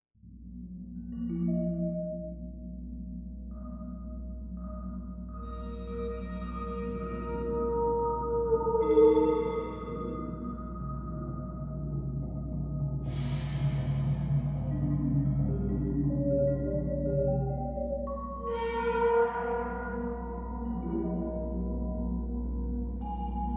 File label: Peculiar Percussion